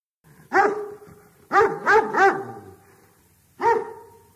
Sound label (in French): Chien qui aboie